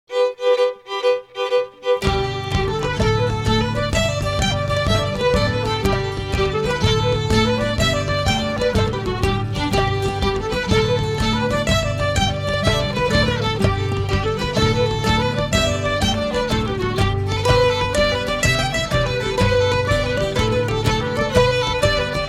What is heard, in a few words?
kesh jig swallowtail jig (instrumental)